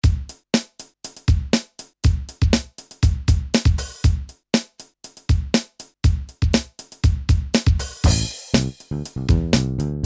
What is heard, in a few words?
Kawai XD-5 Groove and Bass